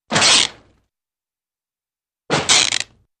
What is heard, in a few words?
2 Large Wood Crunche, impacts